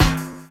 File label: BD; Best Service; Dance Mega Drums